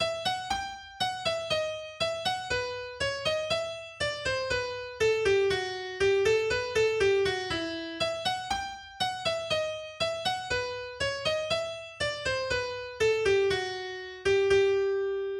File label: Bourree In Em Solo AkGit; Bach